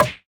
lizard shot melee; crocs

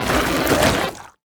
enemy evolve; sfx; evolving Scourge

